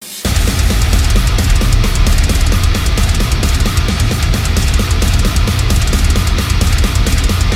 264 bpm

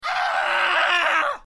scream

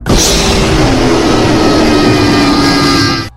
jumpscare; fredbears fright

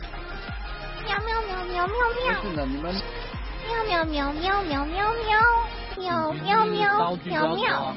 meow; bojun

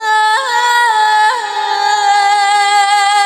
woman arabic music; lol

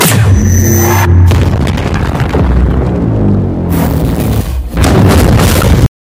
burst; element

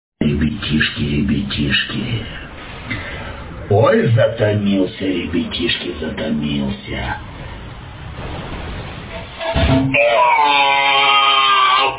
russian guy farts

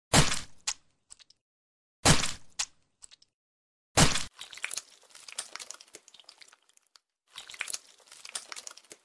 acid splash

